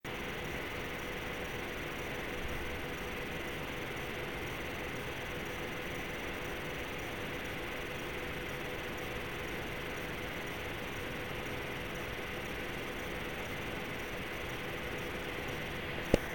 HD 6850 loaded; XFX

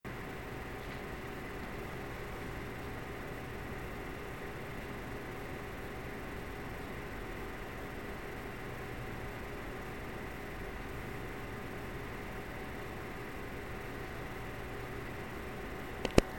XFX HD 6850 idle

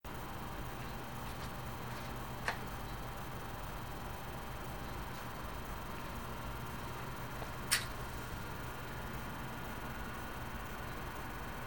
GTX480 fan

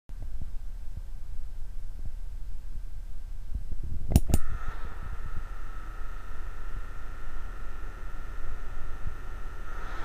noise of barrack

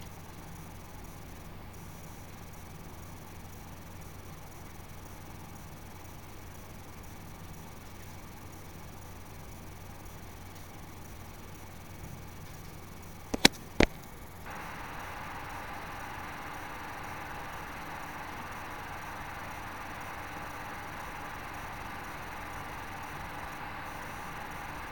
6970 noise; R6970review

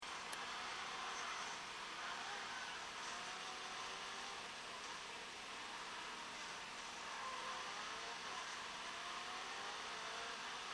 Fan Noise; RazerBlade

